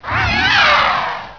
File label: dragon; Dragons